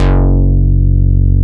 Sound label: DANCE F#1, FM BASS; AKAI S-Series CD-ROM Sound Library VOL-7